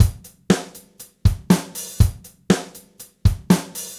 Gretsch groove 120 BPM, 1967 Gretsch Kit drums; Sonic Foundry (Sony Creative Software); Vital Drums

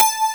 DG WAVE, DIGI SYN

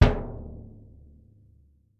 BD F, Percussion; Miroslav Vitous - Percussion & Harp

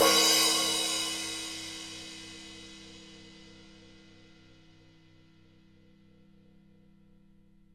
Crash up3; EMU PX-7

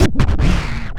ST SCRTCH, SCRATCH

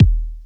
NOMAD KICK; AKAI S6000 CD-ROM - Volume 4; Kick 2

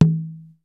RY-30 PERCUS; Rubber Chicken Software Sample Bank

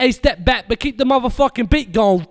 VOX SUNG; Megabass Remix! 2

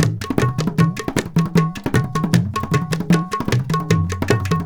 INDIA R, IND ENS; Spectrasonics - Heart of Asia CD2

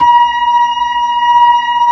B-3, Blues

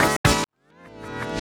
Chords Tones; Equipped Music; Loungin House

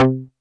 TEARDRP1 C3, Yamaha VP-1; Music Store

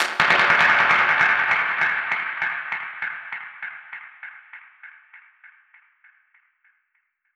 Perc Hit, dub percussion one shots; musicradar

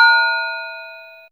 Syn Bell C4, Fairlight CMI IIe Bells

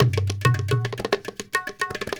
India, Heart of Asia

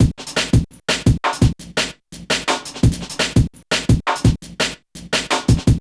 619 BONUS, Boom da Drumz; E-MU Producer Series Vol. 6 – Analogia Project

